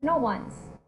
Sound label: beat